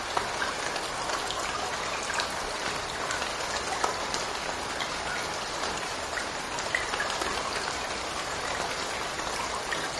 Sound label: Rain